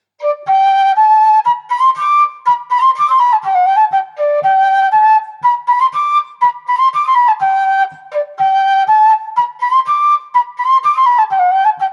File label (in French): Bourree